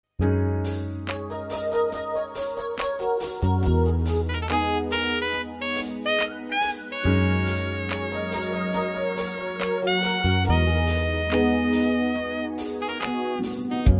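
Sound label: Gb, backing track; key - Gb - vocal range - Db to F; Livetrak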